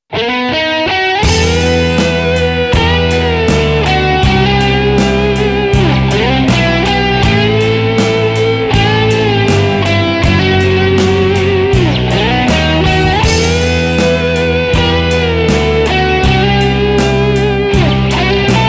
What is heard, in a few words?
Guitar Solo Slow minor